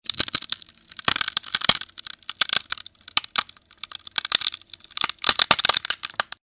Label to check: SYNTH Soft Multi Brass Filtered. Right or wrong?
Wrong